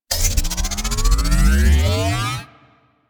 Arch Burst Gun Charging, Weapons; DE; Warframe; Archwing

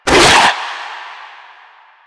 Arm Attack; Warframe; Proto Infested Runner

